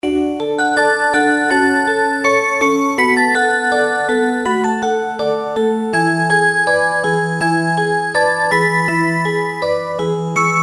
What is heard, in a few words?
rhythm song, clocks